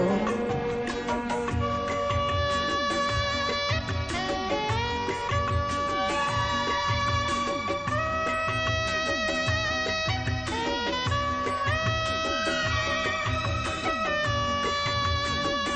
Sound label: Flute